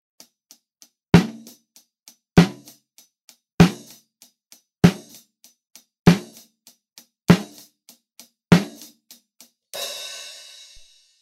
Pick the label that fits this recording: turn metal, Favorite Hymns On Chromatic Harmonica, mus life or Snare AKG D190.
Snare AKG D190